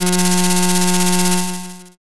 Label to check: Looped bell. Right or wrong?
Wrong